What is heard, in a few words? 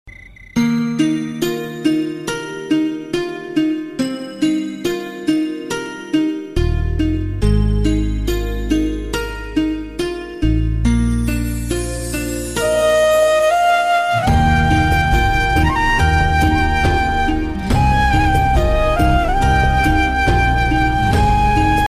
Sad Version